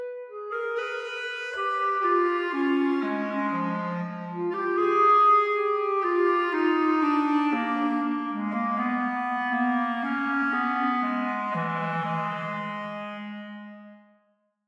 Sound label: clarinet; Toki Tori 2+; Toki Tori Collection